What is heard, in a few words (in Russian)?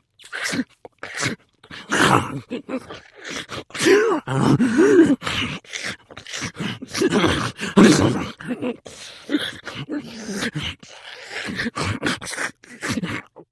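зомби нервное поедание пищи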